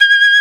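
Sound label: vibrato flute C4; AKAI S-Series CD-ROM Sound Library VOL-8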